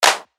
Clap; Monastery; revealed claps snares vol 2

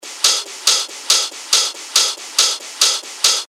techno loop 140 BPM; Atlas; revealed techno top loops vol 8

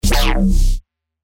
BSHOT C; Duro; revealed bass shots vol 3

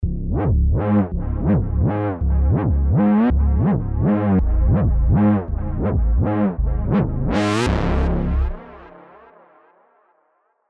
Reese, Diva; Reese Paracosmic; alonso 19 year anniversary pack

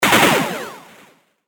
percussion; Mew; revealed percussion vol 2

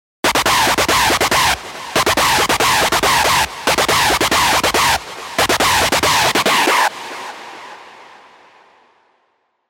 Screechpox, Serum 2 screech; Revealed Serum 2 Screech Pathogen

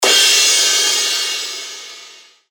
Crash, cymbals; revealed cymbals vol 1

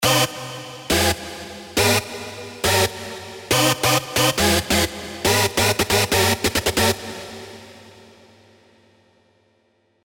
Stab; Stab B2B With Myself; Revealed Serum 2 Rave Asylum

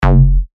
Bass Shot F#, synth shots tech house; Exhale; revealed

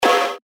percussion; Berserk; revealed percussion vol 3

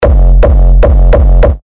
Kick F#; Kore; terra kicks; Revealed Terra Kicks

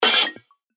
FX Hat, cymbals; revealed cymbals vol 1

